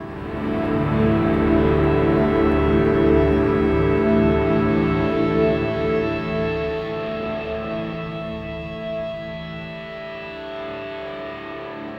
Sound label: Elektro Mass, Elektrik Drone, Sky Church